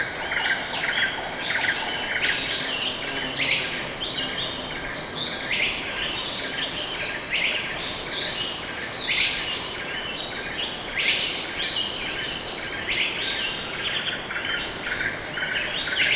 jungle exterior; Counter Strike Model Pack; CS:GO; aztec2

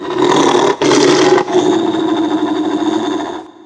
frustrated growl, antlion guard; npc; Half Life 2; Episode 1

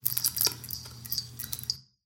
light flicker, ambient lights

